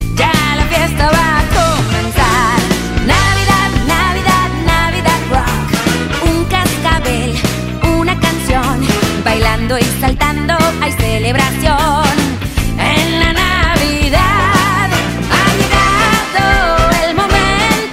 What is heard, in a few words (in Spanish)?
Navidad Rock